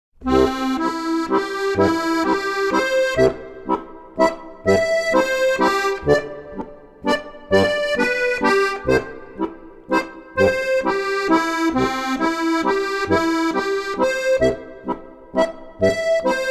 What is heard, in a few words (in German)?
harmonika